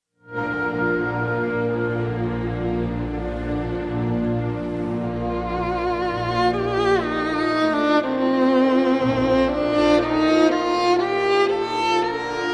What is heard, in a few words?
violin concerto no 1 in g minor; Max Bruch